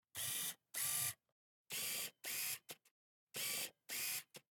Camera, Pentax KS2, DSLR, electronic, mechanical, focus, steady, out in, XY; Eiravaein Works; Vaeyan IV